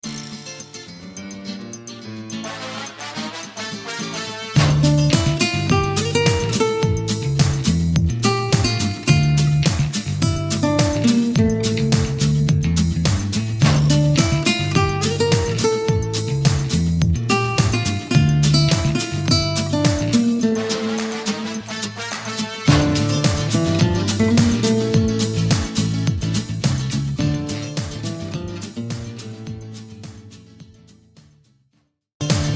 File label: spanish dance, guitar; totallyguitar